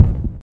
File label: footstep; slender; zerosiki; phonophobia